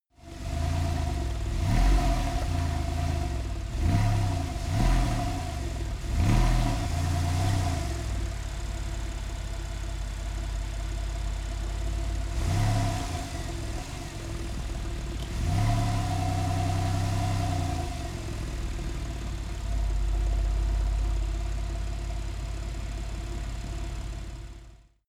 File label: sports car ext engine gas soft